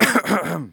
cough; ProStroke Golf World Tour 2007

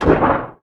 Foot Duct, Footsteps

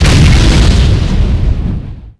Small Exp, Explosions

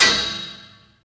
pipe hit; Max Payne

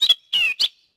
houses bird; Tony Hawk's Project 8; XBOX

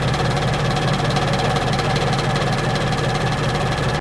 bed; Max Payne; hotel

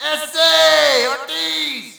Shout, audience; UFC Tapout 2

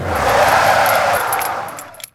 burnout, car; Cars; MATER; XBOX

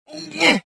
gasp

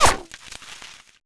snow hit; projectiles; bullethit; Max Payne

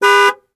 horn; Totaled; XBOX

